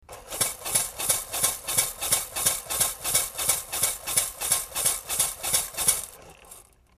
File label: Rattle